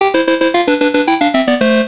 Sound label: Start Stage; The deadliest clown in video games begins the carnage.; video game sound effects; Mr. Do!